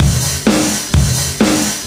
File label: Housebeats 128BPM; Vengeance Samples